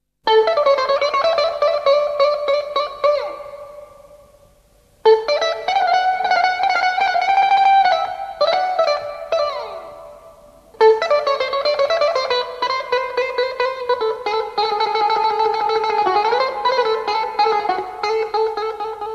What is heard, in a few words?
bouzouki, Greece